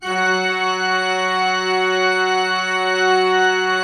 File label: F3, Cathedral Organ; ILIO; Propeller Islands Cathedral Organ